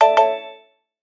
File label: fail